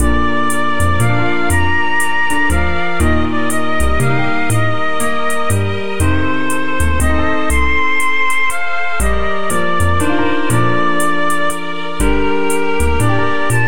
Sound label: General BGM